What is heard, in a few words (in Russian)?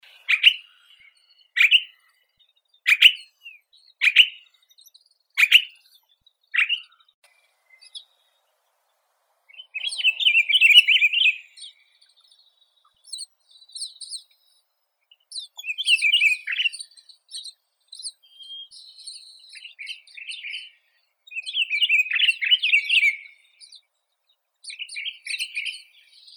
shur pinicola enucleator, zvuki prirodi